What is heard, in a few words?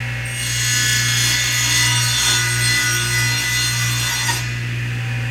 tablesaw